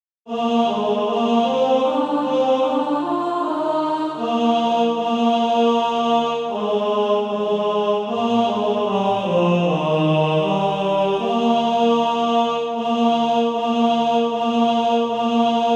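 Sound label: ten, Practise Music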